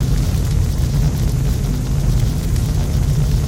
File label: Amb Dojo Fire Gate, ambience; Ninjago